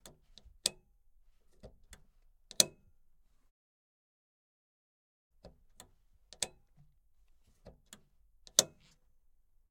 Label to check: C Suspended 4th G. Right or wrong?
Wrong